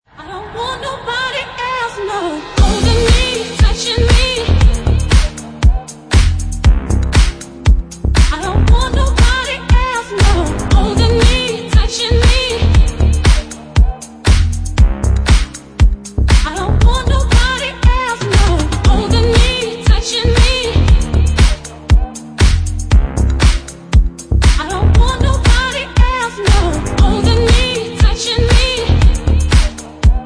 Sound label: romantic